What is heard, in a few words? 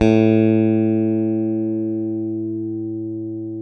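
CLN STRAT, Synclavier, GUITARS; Sampler Library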